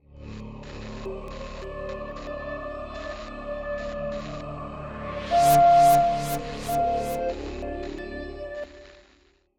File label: Planet Hover; Harvest Massive Encounter